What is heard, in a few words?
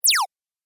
Game Little Laser Shot, Video Game Sound Effects